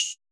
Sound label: perc, Roland MC-909